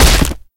hit spade

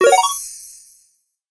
bingo reward

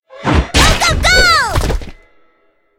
hurry up start

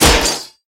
hit; paul

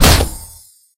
hit

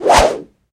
swish

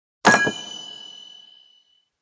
arena trophy

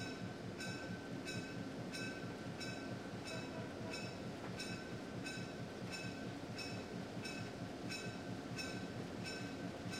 ambi Space Train

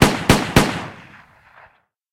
shot rif hdgun

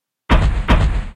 walk

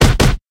hit body kick

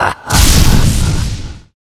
guild battle gimmick thunderbolt

